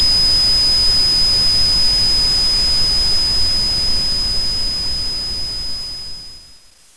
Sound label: ringing ears; DAMAGE